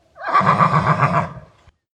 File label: horse neigh